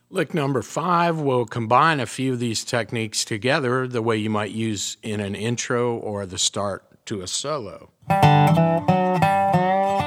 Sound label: resonator pedal, steel guitar; mxr custom shop resonator ii pedal